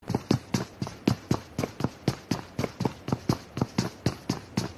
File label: Foot in pubg; پابجی